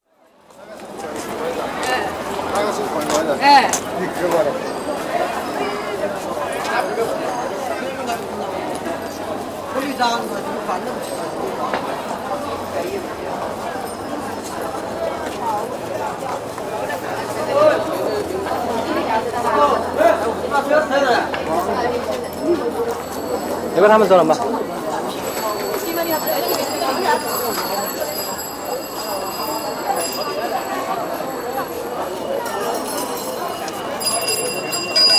Wuhan: Busy street atmosphere with crowds & bicycles; Bicycle Sound Effects; BBC